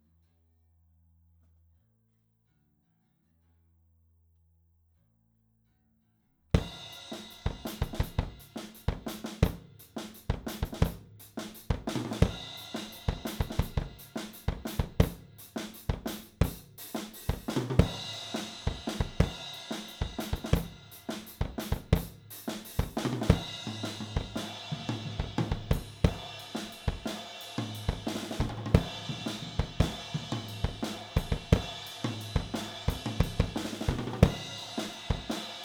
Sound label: AKG414 Drums